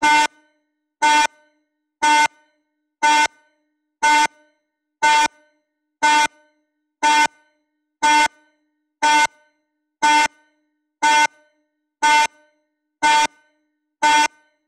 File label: urgency alarm sound alert